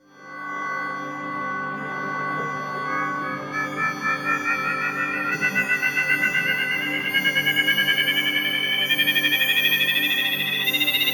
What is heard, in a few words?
Warp Speed Atmos